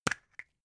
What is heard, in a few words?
plastic spray can small open